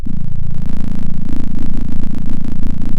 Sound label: center, sound of a small motorcycle